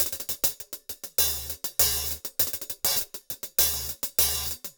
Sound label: Top Ends; Zero-G; Monster Beats